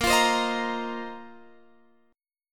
A sharp Suspended 2nd Suspended 4th down Guitar Open F; A#sus2sus4 strummed